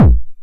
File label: 909 BD Tape Sat